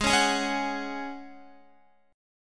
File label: G sharp 7th Suspended 4th G sharp, Guitar; G#7sus4; x,11,13,13,13,14; strummed